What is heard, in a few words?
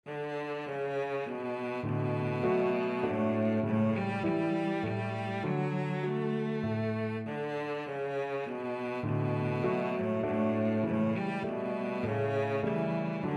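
violoncello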